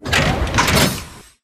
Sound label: med bay rack offload; Titanfall 2